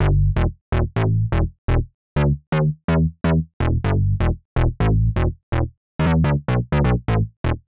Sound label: Synth Bass E, 125; PowerFX - Nu Jazz House